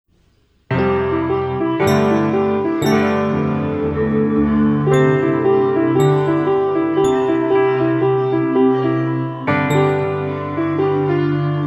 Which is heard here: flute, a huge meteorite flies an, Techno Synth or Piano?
Piano